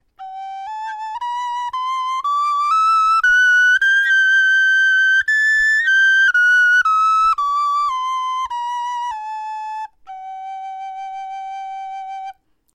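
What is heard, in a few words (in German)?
tonleiter, Knochenflöten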